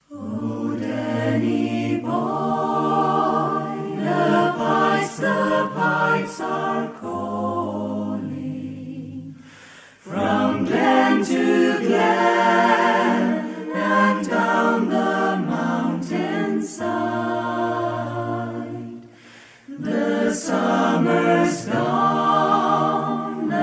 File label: vocal ensemble